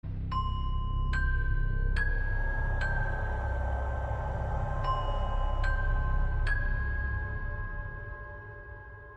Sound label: 3g Ringtone